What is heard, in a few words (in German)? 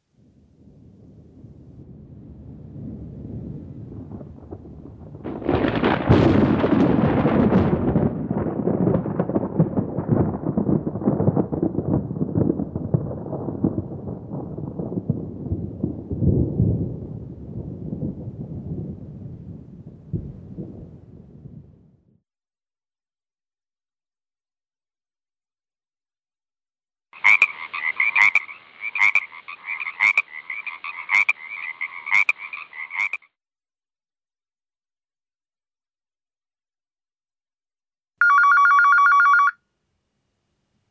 Klanglandschaft; Stillsitzen wie ein Frosch